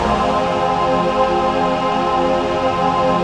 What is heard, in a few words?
VOICE PAD, ATMO PADS; Best Service; XX Large